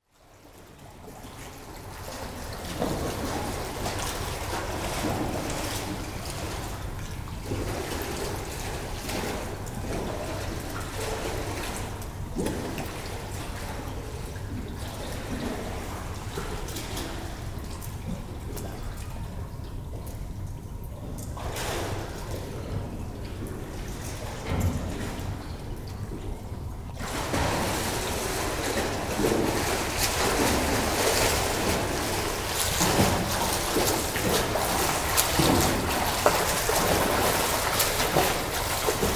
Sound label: Swimming and Diving - Swimming - almost empty indoor pool, 1 person passes; The BBC Sound Effects Library; Sport and Leisure